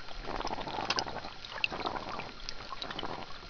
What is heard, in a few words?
groan; river project sounds; groan type a